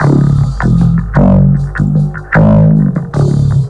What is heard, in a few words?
SUPERBASS; USB Soundscan vol.33; AKAI; Disjointed Textures